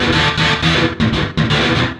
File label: GTR, Chemical Beats